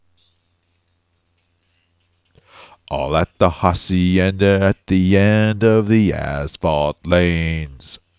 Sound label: vocal